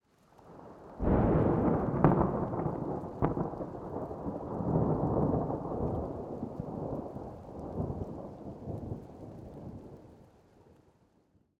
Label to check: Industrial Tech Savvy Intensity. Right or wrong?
Wrong